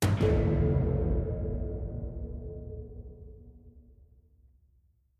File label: quest failed; Tyranny Gold Edition